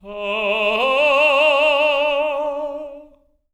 LYRICAL; Spectrasonics; Symphony of Voices

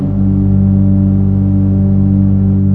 FLUTES G1, organ; AKAI S-Series CD-ROM Sound Library VOL-2

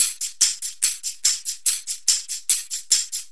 TAMB; Zero-G; Chemical Beats

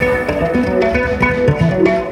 World Lick

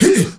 Heart of Africa SHOUT; Spectrasonics; Heart of Africa 1; CH. SHOUT2

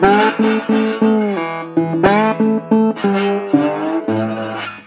120, Blues Guitar; ILIO - Fretworks

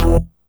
Synth Hit E

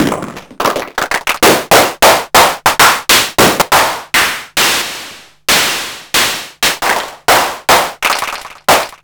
Simmons Clap Trap

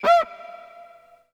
GUIR; USB Soundscan vol.47; AKAI; TIMBA; Hip-Hop & R&B

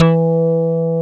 PULL BA; Synclavier Sampler Library; KEYS AND GUITARS

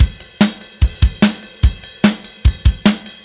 Beat, Indie Rock

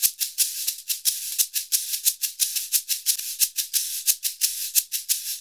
SHAKER, Modern Percussion Loops

